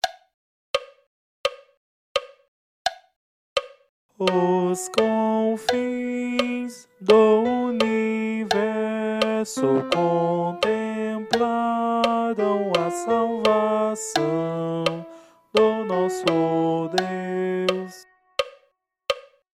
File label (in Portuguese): Natal Missa coro